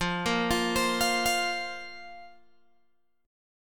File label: F Suspended 4th F, Guitar Open G